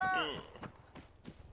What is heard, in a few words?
hummmmm